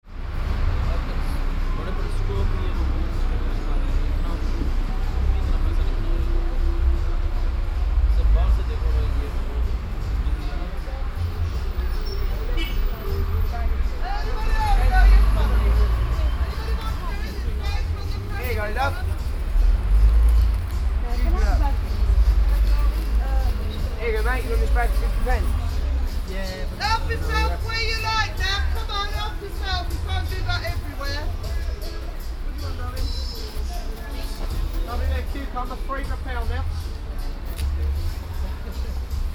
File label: reading market